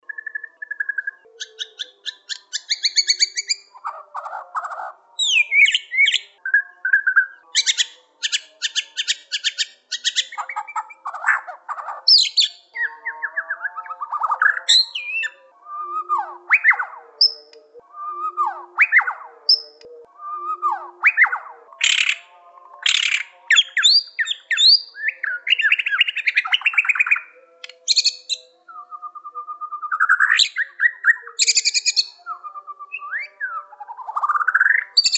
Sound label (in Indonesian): audio murai borneo